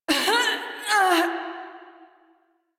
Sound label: Death, Female; Railjack Crew; New Loka